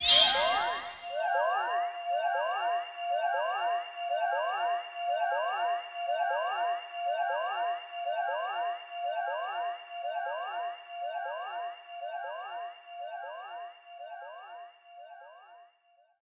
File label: whiteout